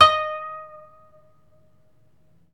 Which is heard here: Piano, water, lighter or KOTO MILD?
KOTO MILD